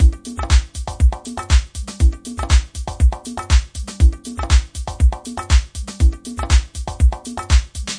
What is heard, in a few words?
my conga luvs drum machines, 120 bpm